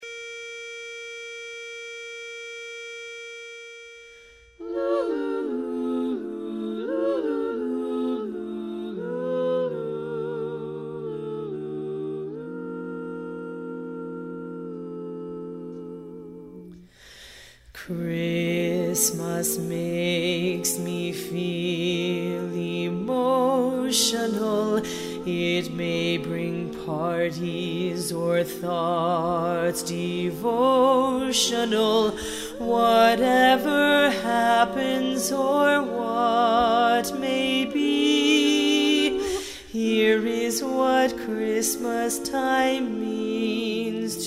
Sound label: solo part